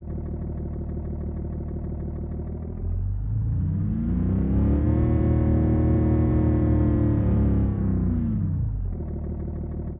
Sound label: diesel 2.5 german, engine sound